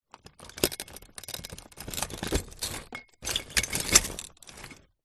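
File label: broken glass bottle pieces crush grind; zapsplat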